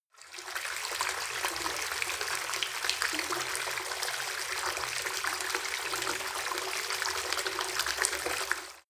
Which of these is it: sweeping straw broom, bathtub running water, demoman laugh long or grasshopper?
bathtub running water